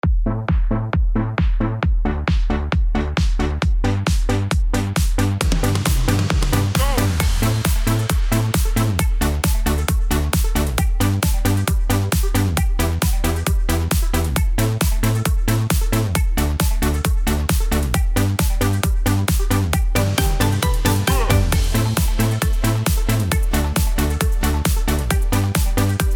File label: Midi Yamaha